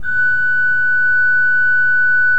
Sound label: F5, Cathedral Organ; ILIO; Propeller Islands